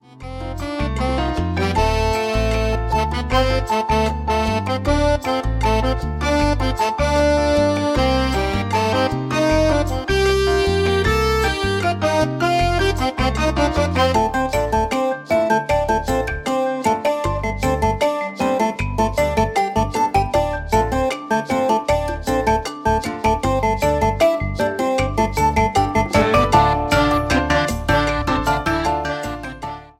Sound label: Game victory screen; MIUI14